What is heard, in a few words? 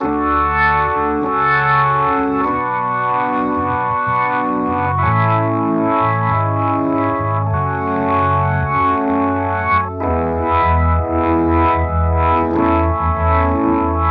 rotovibe